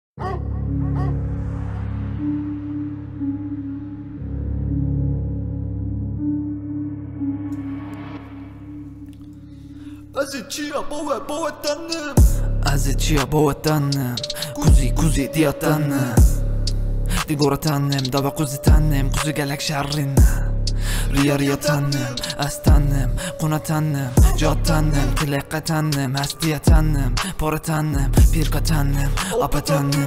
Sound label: kurdish trap kurdish drill